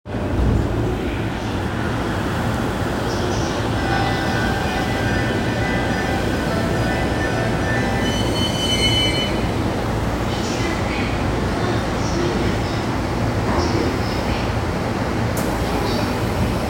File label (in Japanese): 中央・総武線高円寺西, train sounds